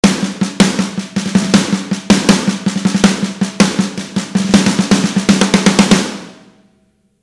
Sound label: Snare Mixed; AttackDrums